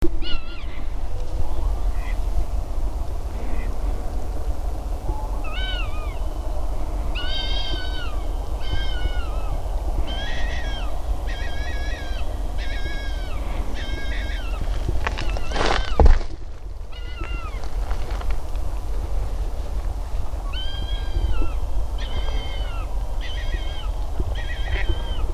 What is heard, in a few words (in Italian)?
Lepre europea; xenocanto; der hase